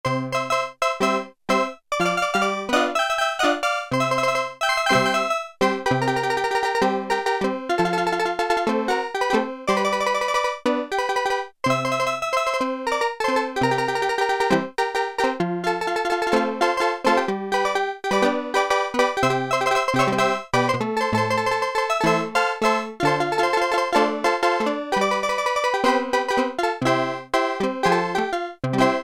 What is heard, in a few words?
STEEL DRUMS, Oberheim OB-8; Factory Patches